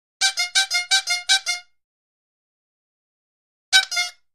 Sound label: Horn 2 Toy Horns Honks